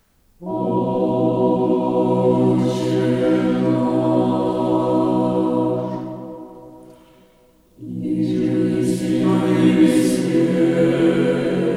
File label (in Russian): Камерный хор Отче наш; Возрождение